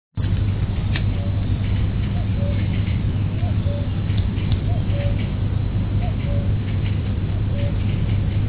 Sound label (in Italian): cuculo